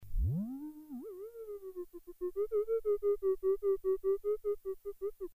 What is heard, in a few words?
clangers; AbdulsJaycar